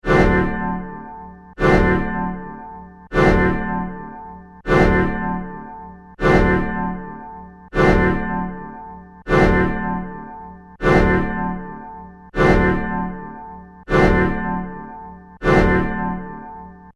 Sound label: Alarm Repeating Pulse Loop, Emergency alarm sounds